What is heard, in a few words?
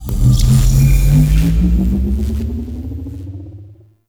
UI Mod Activate Shields; Warframe; Railjack; Player Ship